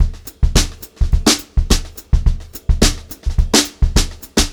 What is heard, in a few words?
HOTROD VARI, Brushed Drum Loops